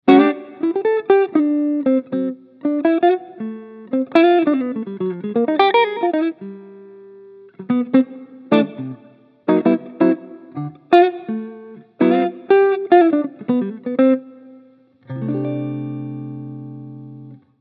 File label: jazzbox